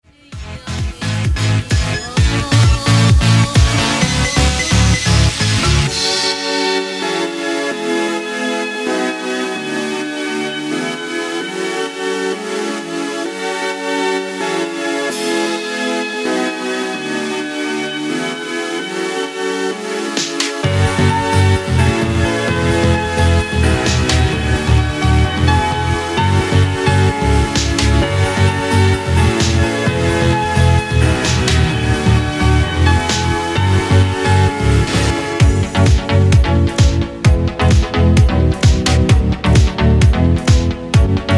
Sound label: dance music